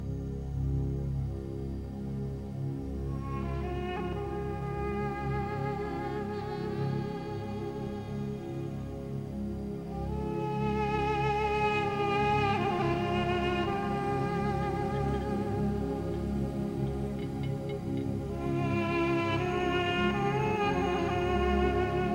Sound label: duduk